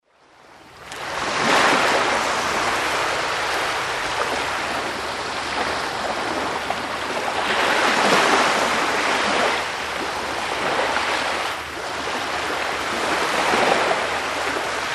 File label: Sounds of weak waves in the ocean; Звуки слабых волн в океане